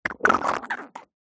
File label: cartoon squelch